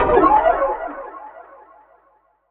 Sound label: sfx, drum & bass, 165 BPM; Ueberschall; Drum & Bass Resonance